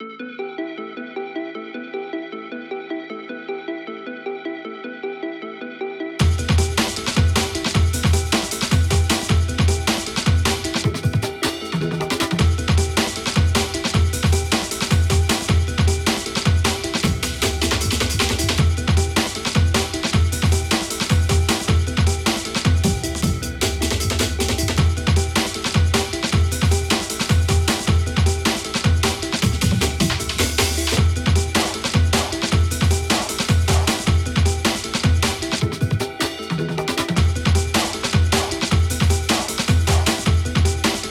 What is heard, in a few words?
dnb